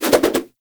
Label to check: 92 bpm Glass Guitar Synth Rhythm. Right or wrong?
Wrong